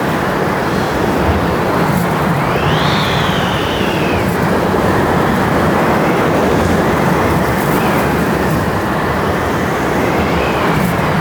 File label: Wind outside